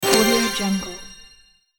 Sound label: fantasy card game treasure won